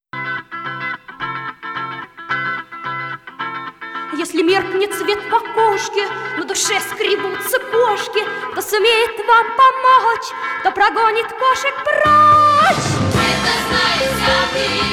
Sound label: dog song